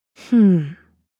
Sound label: Young woman moan with satisfaction